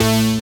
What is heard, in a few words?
SYN SYNTH, dance synth; Sound & Vision - Gigapack I CD 2